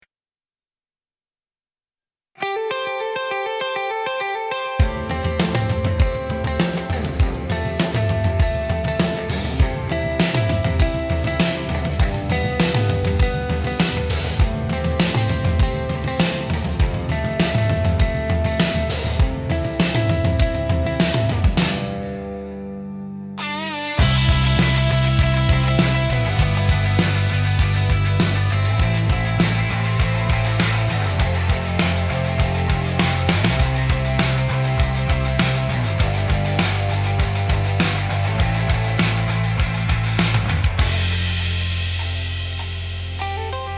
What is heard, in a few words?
instrumental